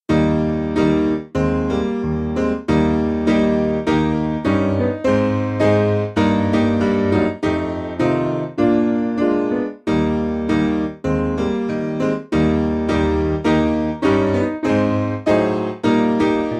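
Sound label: Piano, Music Backing Files for Churches; Worrell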